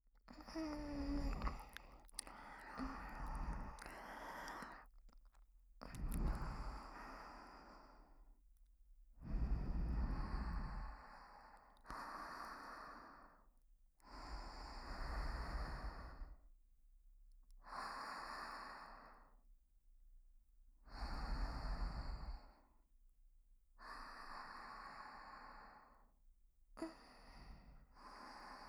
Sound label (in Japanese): 寝息 姉妹; SEなし